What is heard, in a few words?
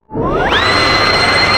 cgun whirl up; DoomRTX